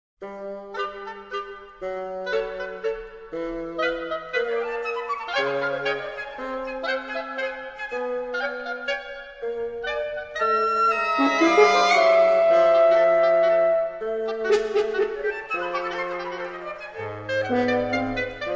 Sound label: wind quintet